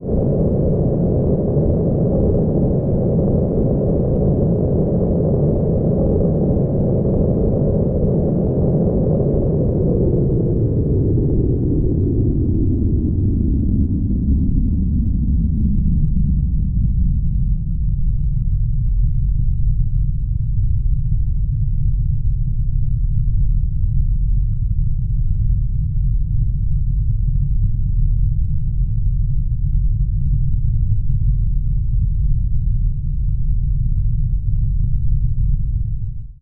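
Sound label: spaceship landing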